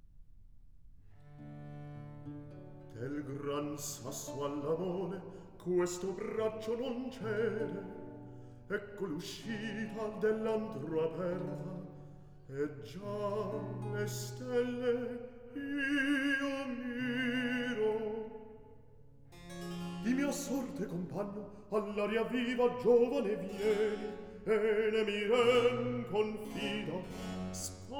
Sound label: Recit; Act II; Del gran sasso; Handel; Ottone, re di Germania; Ottone, HWV 15